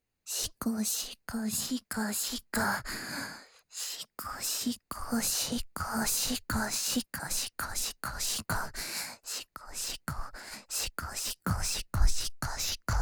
手コキ（しこしこ・右）（SEなし）手交

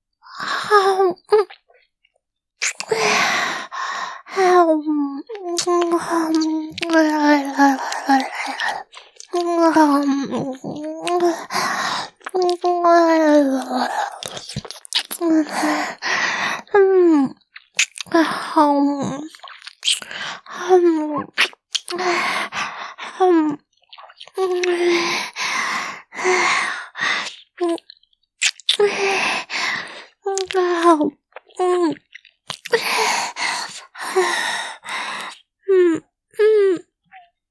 くちびる合わせ, キス, ASMR

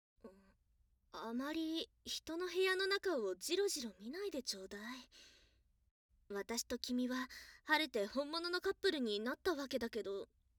本音全開自宅デート オホ声初セックス 効果音なし

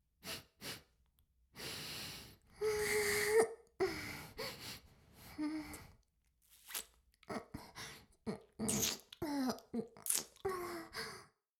フェラ音声; 魔法少女マスカリマッスル

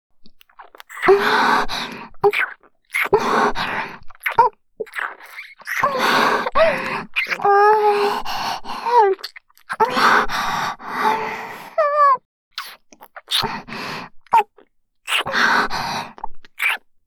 リップキス

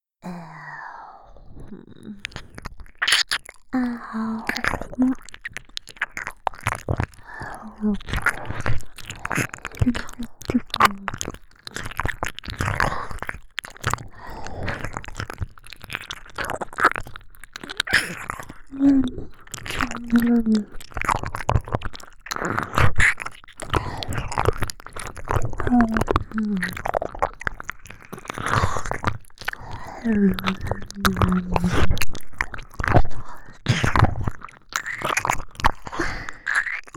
耳舐めループ(姉妹); 触手音 フェラ音 耳舐め音オンリートラック

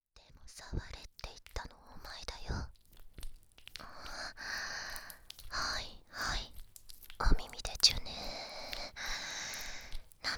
耳舐め 手コキ, 洗脳 ノイズ; 恋人同士でしか出来ない、マジ気持ち良い耳舐め手コキを、洗脳ノイズで速効体験♪; 洗脳ノイズ♪No.1耳かき店員があなたの耳フェラ奴隷に♪

